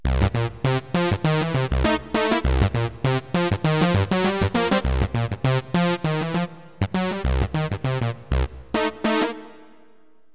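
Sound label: sawtooth; Roland; An Invitation To The Roland World Of Music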